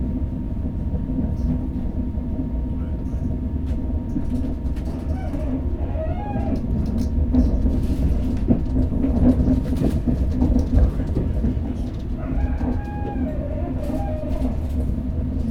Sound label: Belgian Train Loop